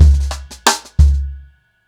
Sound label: End 90BPM, ACOUSTIC DRUMS; Sonokinetic - The Roots Drumloops